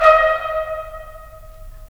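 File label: brass trumpets staccato, left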